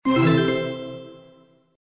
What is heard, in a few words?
win First